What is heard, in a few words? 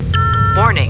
critical stop; Arrêt Critique; windows events